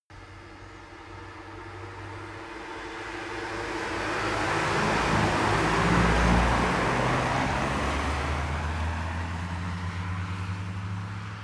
car drive by multiple medium speed

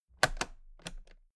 toolbox latches close heavy clatter; industrie wirtschaft; handwerk

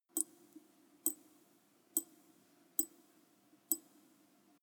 sink water drip slow, badezimmer; haus-garten

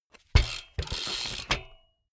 bmx bike pedal grip freewheel

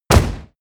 hit sweetener; impacts

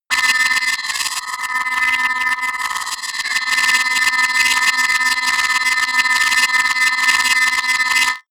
pitch jump rumble long, sciene fiction

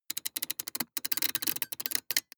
metallic rattle, robot; comedy cartoon

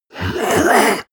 monster gasp aggressive; kreaturen

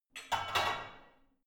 barbell only replace, fitnessstudio

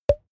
pop simple; koerpergeraeusche; comedy-cartoon; soundpool

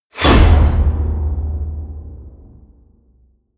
wham, whooshes

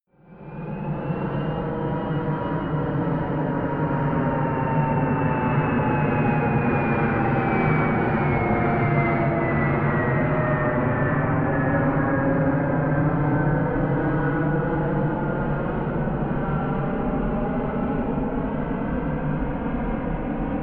plane boeing 777 flyby distant; flugzeuge verkehrsflugzeuge; verkehr transport

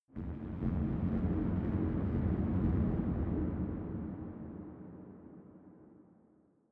space alien spacecraft huge idle far away; raumschiff

